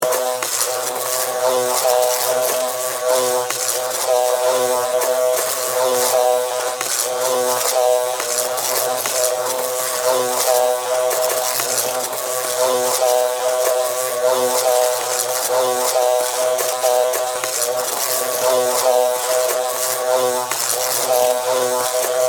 Electrical Arching, Zaps, Current, Flow, Science Fiction; BlastWave